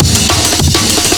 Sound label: break